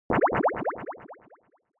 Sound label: Anime Motion Delay